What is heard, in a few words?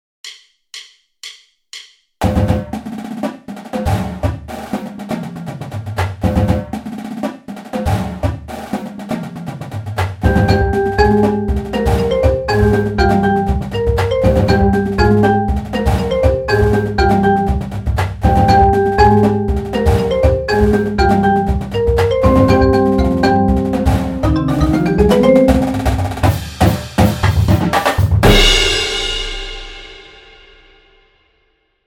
PERC